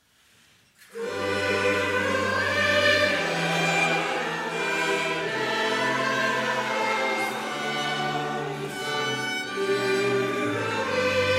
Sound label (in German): kyrie, chorgemeinschaft, kirchenmusik; brigittenfest